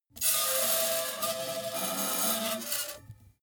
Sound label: metal screech phaser special